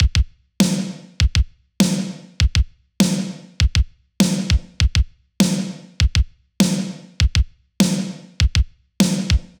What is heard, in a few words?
Kick Snare Smash 100bpm; LANDR